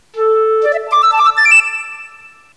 flut run; Presentation Partner

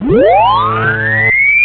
beam load

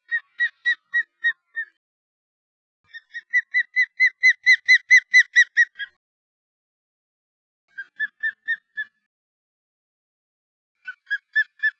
osprey, Songs; The Ultimate Birder

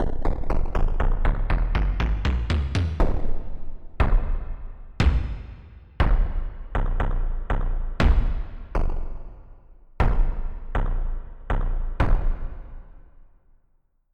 Solo Tom Dry

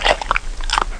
Lick Lips

